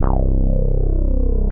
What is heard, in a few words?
Funk Nation Bass; Basses; Ableton; Creative Extensions; Poli